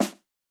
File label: drum; drumming Practice